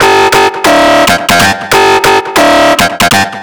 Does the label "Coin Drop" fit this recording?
No